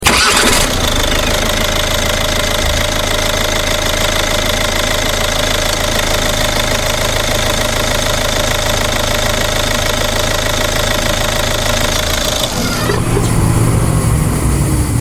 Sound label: Ford Focus Ghia TDCi Ignition Outside